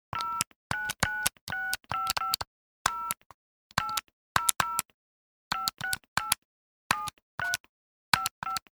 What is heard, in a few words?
Touch Tone, Dialling buttons on touch tone cell phone; Cell Phones; Electronics